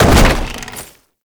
Land Heavy Wood; Movement; Warframe; Uriel

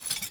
Idle Sword Drop; Hub Idle; Ambience; Arthur; Warframe; Nineteen Ninety Nine

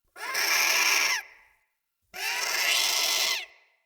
Day Close Critters

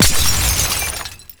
Glass Ring Partially Destroyed; Warframe

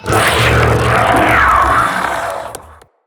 Spider Vocal Attack; Vocals; Root Spider Mother

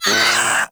Kavat Vocal Pain; Warframe; Khora

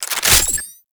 Lato Remaster Reload B, Weapons; Warframe

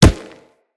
Kubrow Run Dirt, Footsteps

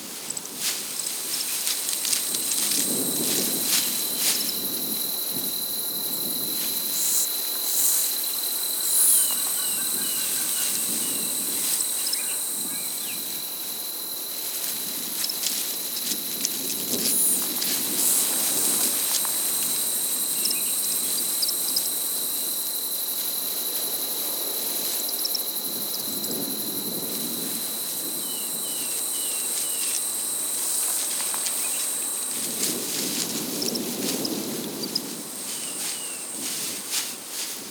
Exterior Foreground Field Loop, ambience; Duviri

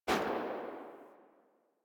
Weapon Justice Rifle Fire Tail; Warframe; Caliber Chicks Minigame; Weapons

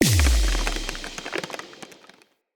Thorn Bow Stone Arrow Impact Enemy Full Charge; Weapon Impacts; Envoy Fey Bow

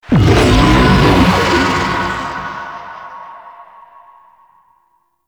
tank infested heal lure stop; Enemies; Abilities; Lasrian Tank; Warframe

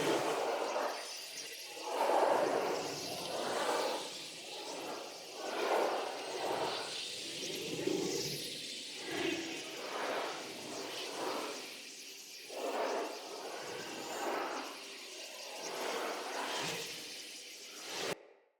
Staff Caster Block Loop; Weapons; Player; Staves; Starter

